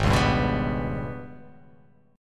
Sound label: G sharp Minor Major 7th Double Flat 5th; 4,4,5,4,7,6,x,x